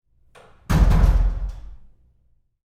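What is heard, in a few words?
Door Bathroom Stall Close Roomy Medium; Household; Big Room Sound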